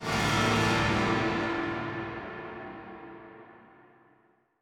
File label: Stinger End; skykeepers; ps4 windows xbox one gamerip 2017